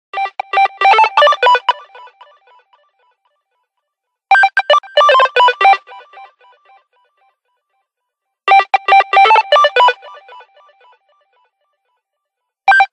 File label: light music ringtone